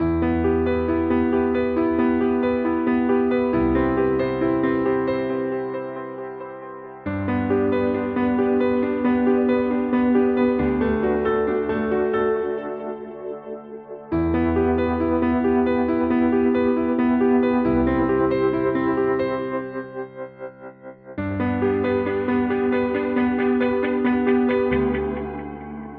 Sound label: timeless 3 presets